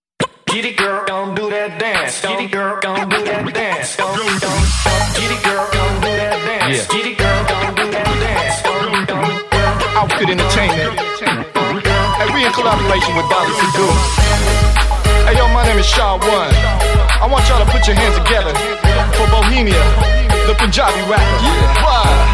Punjabi Rap

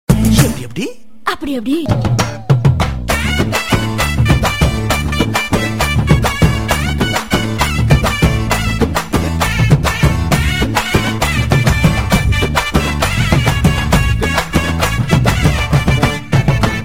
Drums Beat; Villu

